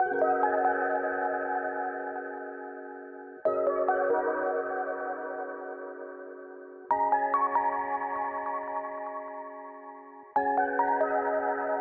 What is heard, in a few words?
Moody, notifications; Vestel; Venus